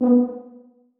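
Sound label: tuba staccato a#3; Sonatina Symphonic Orchestra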